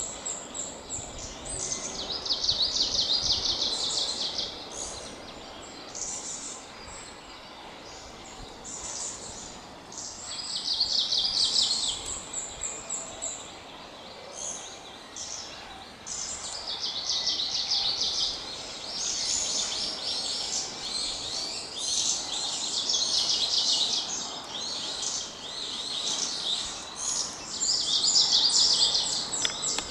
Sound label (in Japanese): oomushikui